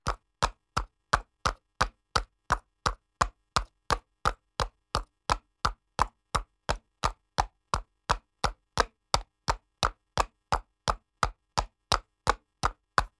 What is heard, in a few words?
horse trot on pavement street; mixkit